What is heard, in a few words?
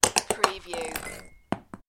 Christmas bauble dropped on a table; beanstalk audio